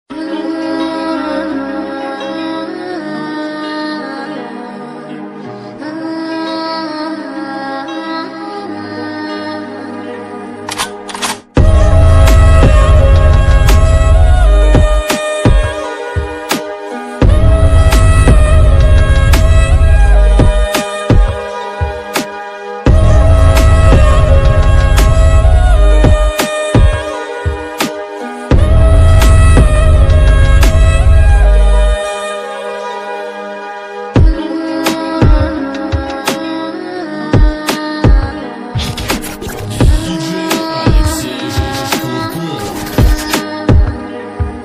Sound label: instrumental music; Joker Bikalam